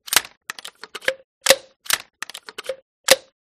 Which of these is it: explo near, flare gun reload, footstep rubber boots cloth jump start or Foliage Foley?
flare gun reload